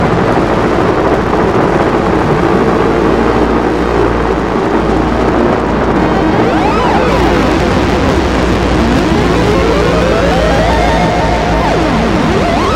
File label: green noise